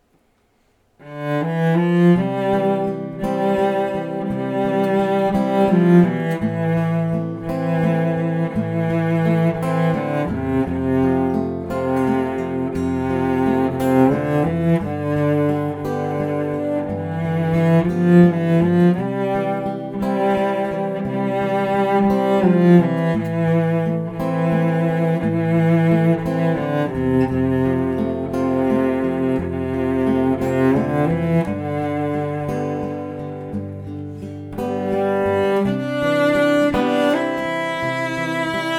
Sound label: Cello Guitar Duo; from Twilight